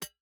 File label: environment pickaxe hit iron